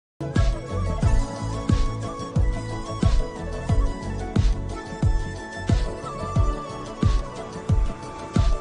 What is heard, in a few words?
Flute Ringtone; soft Punjabi melodie